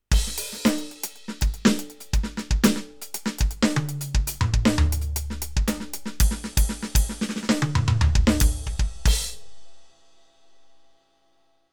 Roland TD07 Studio

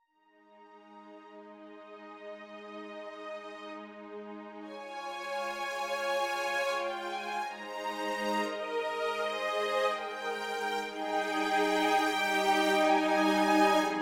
Legato Orchestra

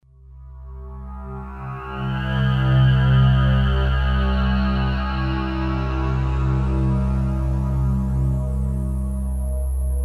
FM8; Kore; Saturn

